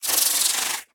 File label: Spider Aggro; The Inn